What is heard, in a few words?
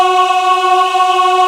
Aahh Chor, VOICE; Best Service Gigapack 1